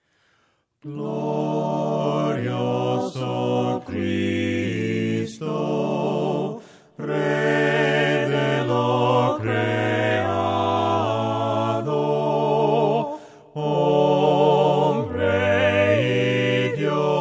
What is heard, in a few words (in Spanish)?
VOCES, himnos a capella; cantos del camino; GLORIOSO CRISTO